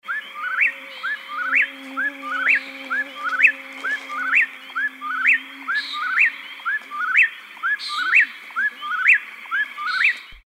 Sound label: Whip-poor-will